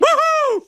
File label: woohoo; homer